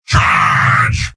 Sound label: soldier battle cry; mvm